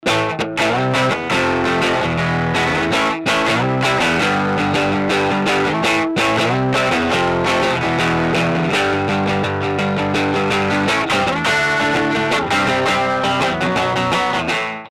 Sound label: overdrive